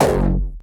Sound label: Kick HardStyle